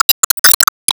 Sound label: Player Pain Damage